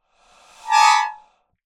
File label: Clusters, Melodica